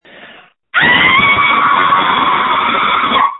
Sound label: Scream